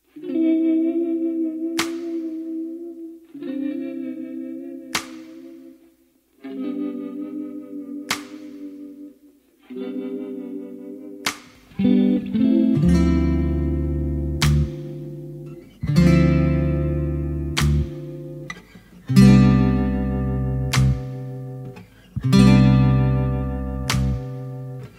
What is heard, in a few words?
Acoustic Instrumental